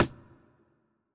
Strike Imp

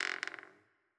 Sarco Squeak; Legend of Keepers

